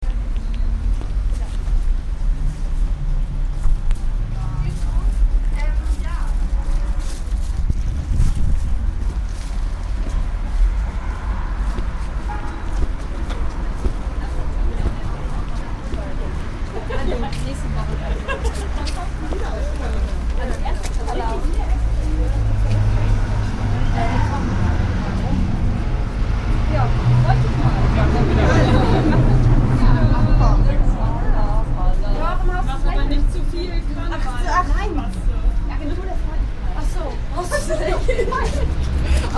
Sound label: street before shop schanzenviertel hamburg